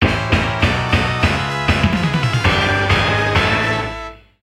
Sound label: Game Over; Mahou Daisakusen; Soukyuugurentai Otokuyo; Raizing; Saturn